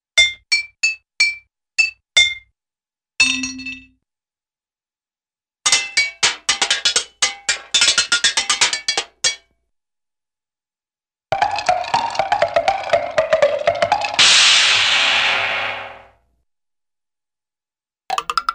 Musical Coin Bounce - Beats on Pans - Clapping, cartoon; Hollywood Edge; Cartoon Trax